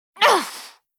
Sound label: VOX Get Hit; Li Li; Heroes of the Storm; warcraft